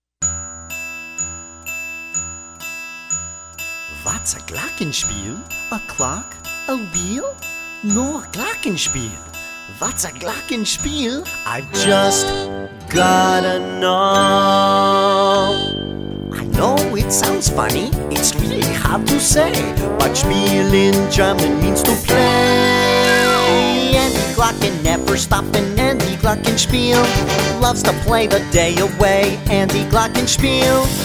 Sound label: whats a glockenspiel